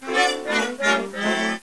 neg buzzer

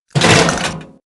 hunker down

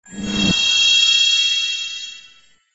Hypno Goggles

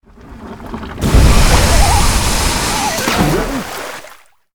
squirt Geyser

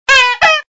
sound bikehorn

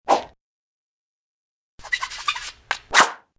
Pool Shark

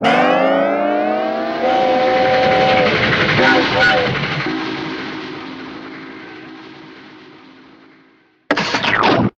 Railroad

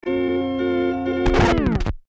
throw wedding cake

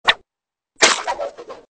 Banana Peel deploy

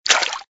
Fishing splash

